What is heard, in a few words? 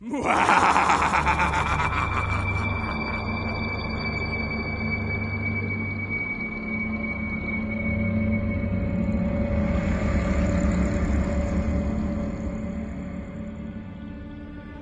Halloween Horror